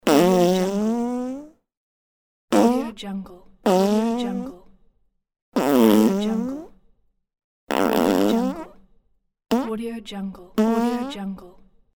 fart

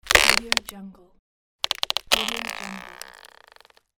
wood crack

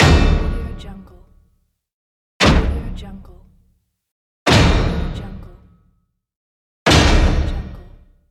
huge metal impact

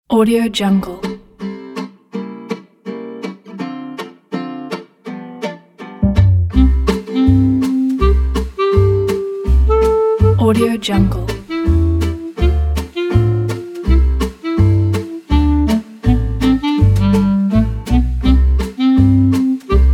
gypsy clarinet

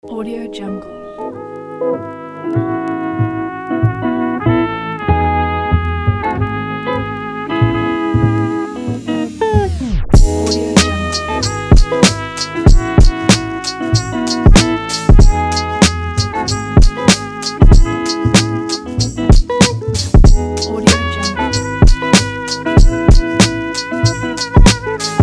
funk groove jazz hiphop

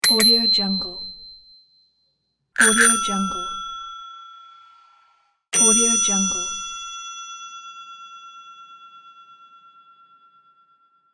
bicycle bells

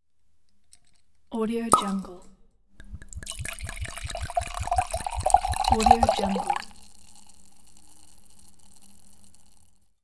wine bottle pour; sviridpavlov

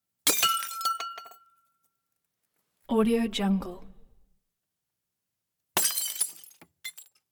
wine decanter drops and breaks deep resonance

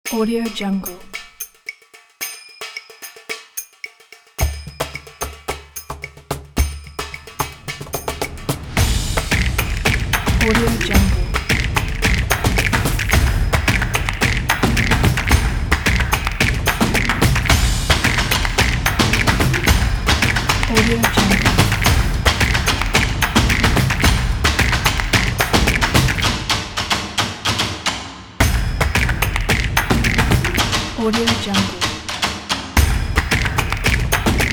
percussive

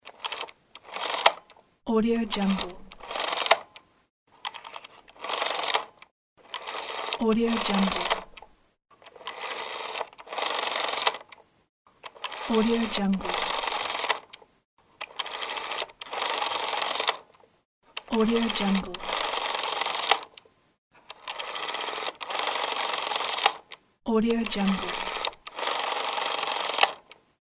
rotary phone dialing

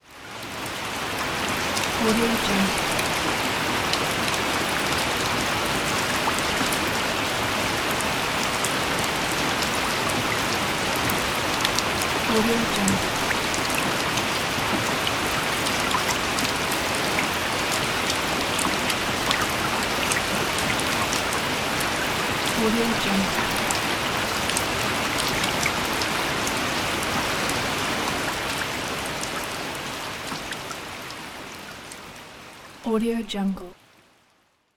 soft rain

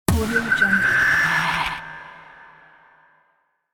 horror impact

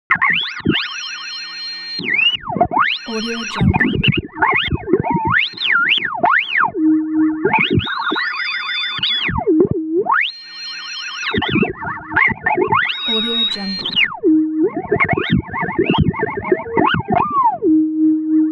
atmo abstract dissatisfied robot; smartsoundfx

